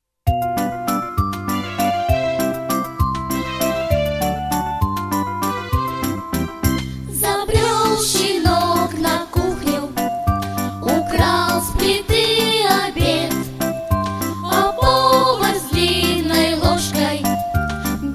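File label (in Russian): Звонкие голоса минус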